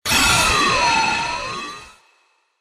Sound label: beamgun